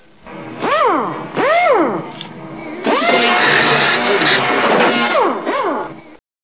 electric drill

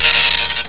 dot matrix, computers

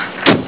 car door shut

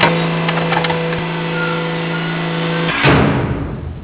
electronic door

